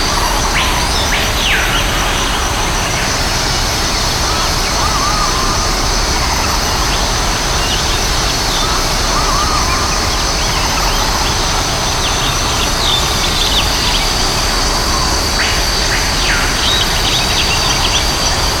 Exterior Well Amb Loop

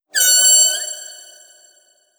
Critter Female Verb; moul; Kahlo

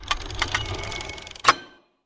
Ring Puzzle

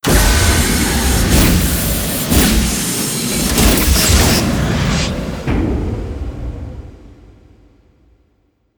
Maintainer Tube Down

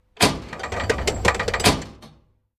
Floor Low Button up

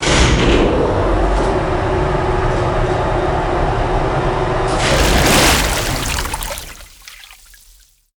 Legs Down

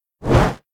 Flapping; Bahro

